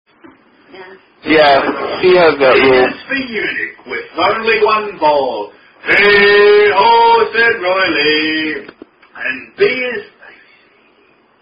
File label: Alphabet Drinking Song